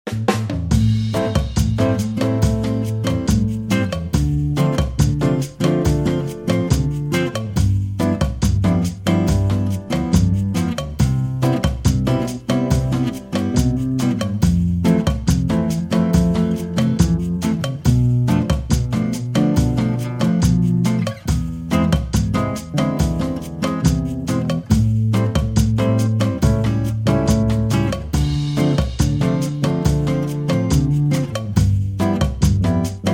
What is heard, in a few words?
redlight bossa nova; cbStudioProductions; musique pub; publicité